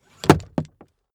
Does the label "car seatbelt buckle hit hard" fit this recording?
Yes